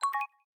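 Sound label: Pen attach notification; Samsung Galaxy S7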